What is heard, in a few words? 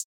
HiHats